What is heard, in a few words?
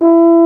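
baritone E3 horn; InVision Interactive; Lightware VOL-1; Instruments & Percussions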